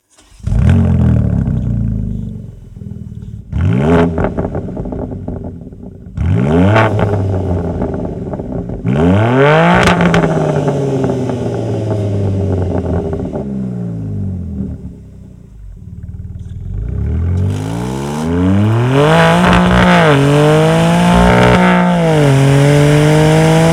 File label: SLIP ON LINE BMW M4 OPF